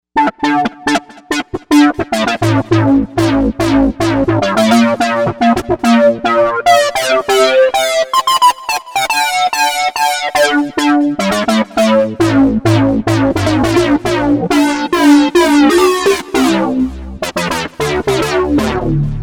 Tech Rock, Novation UltraNova; Factory Patches

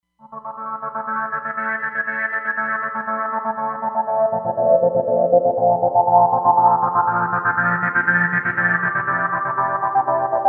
space slice

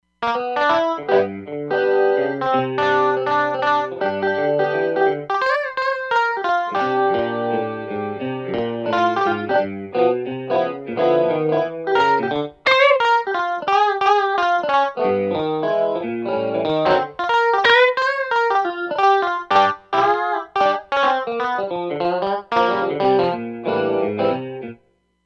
Strat, Roland Fantom XR; BluNoteStrat

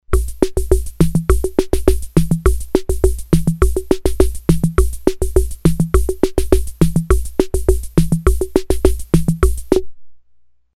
MIXED, Ace Tone Rhythm Ace FR-2L

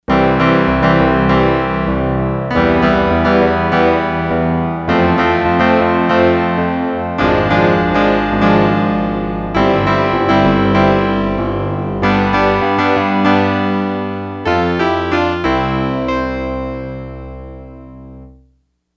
Elec Grand, Yamaha DX100; Factory Patches

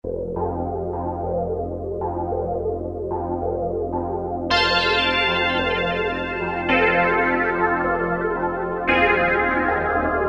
Pluck Sweep, Roland Juno-106; Factory Preset

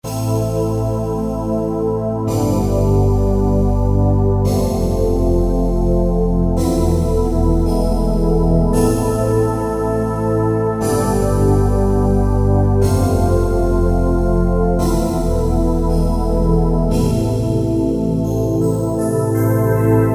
Staccato Pad, Korg TR-Rack

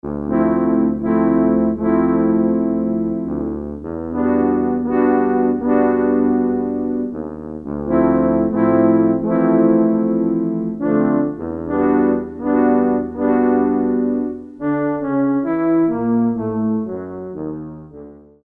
Horns, Korg DSS-1, Brass

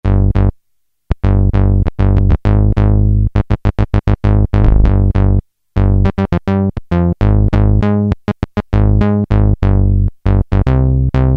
Synth Bass II, Roland Juno-106; Factory Preset

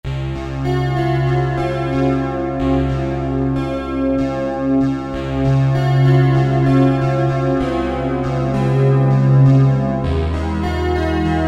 Square Keys; Roland SR-JV80-11 Techno Collection

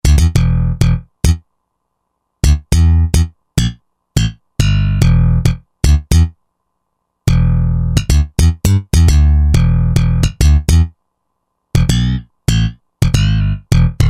Slap Bass, Roland JV-2080

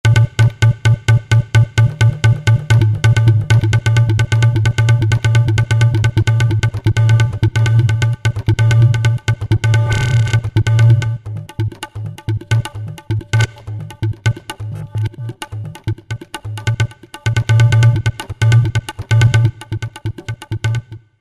Dyna Tabla, Roland V-Synth; Factory Patches

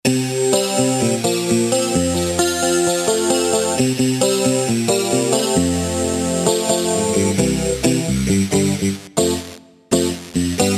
Broken Guitar; Roland SC-880; Factory Patches

